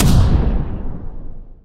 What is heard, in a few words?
shot Tank; JetTank